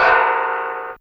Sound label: Spring C4, Bells; Fairlight CMI IIe